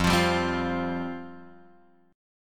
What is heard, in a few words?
F Suspended 2nd, chord; Fsus2 Chord {1 X 3 0 1 1} strummed